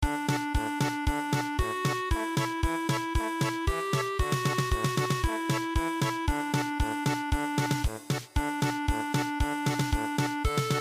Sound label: German Polka; Acha Pacha Nocha